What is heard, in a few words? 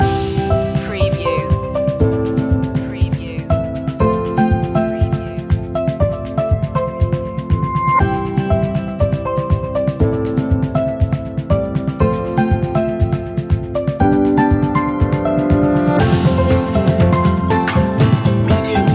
a warm welcome no vocals